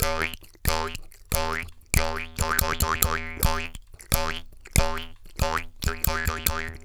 Jew's Harp 140; ethnicity

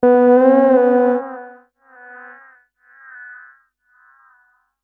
Atmos, Atmospheres; Akai; Mule

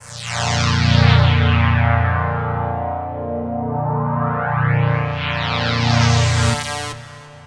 Ana Phase, Synth; Akai; Mule

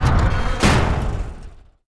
door futur closed; FEAR

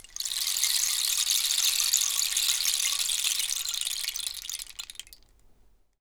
Rainstick, ethnicity

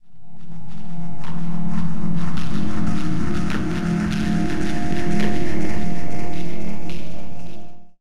Atmos, Atmospheres; Akai; Mule

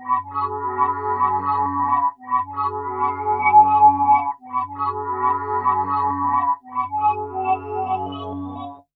Melody, Akai Pads; Mule

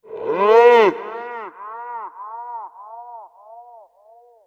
Vocal, Atmospheres; Akai; Mule

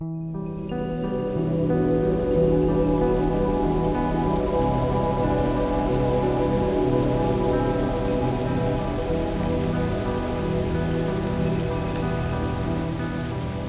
login, rainy soundscape

